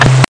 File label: Static Off